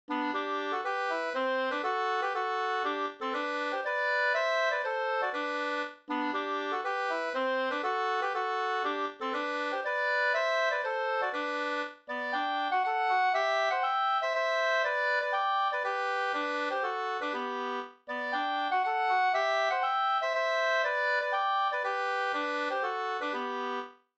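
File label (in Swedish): polska, tvåstämmig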